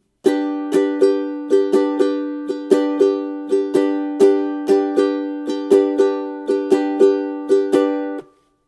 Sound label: d du udu udu ud, ukulele